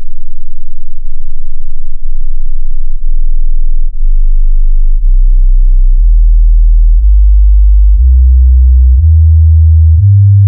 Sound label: step sine